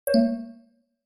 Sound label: error